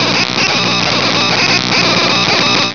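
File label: short circuit; Simon and Schuster Interactive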